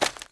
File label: walk light